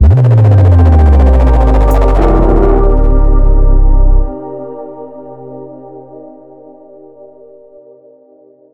Transition End; Everspace 2

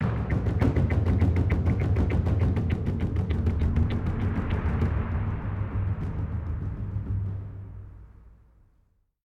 Sting Enemy Destroyed; Star Citizen demo

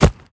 Fishmen Footsteps Dirt Run; We Are the Dwarves

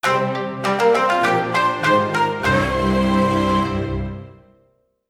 Jingle - Rescue Successful!; Shiren the Wanderer The Mystery Dungeon of Serpentcoil Island Switch gamerip 2024

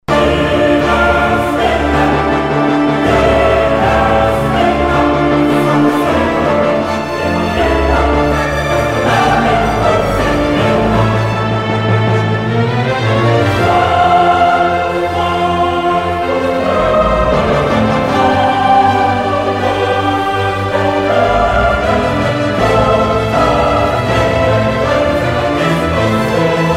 Requiem in D Minor K. 626 Dies Irae; the king of fighters 98 ultimate match; Wolfgang Krauser

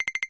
Talk High

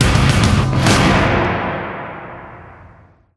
STINGER TURRET WAVE DESTROYED, soundtrack

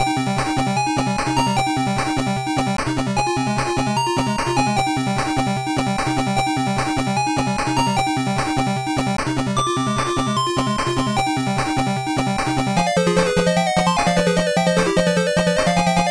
Boss Fight; Golden Axe Warrior; Master System

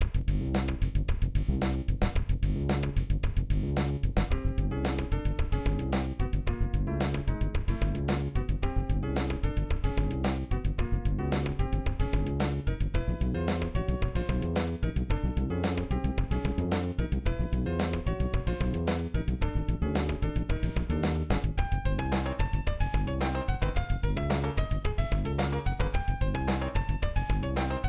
Level Music; Spindizzy Worlds; SNES

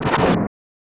Thunder

